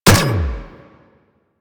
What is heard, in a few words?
Energy Rifle Shot; Warframe; Weapons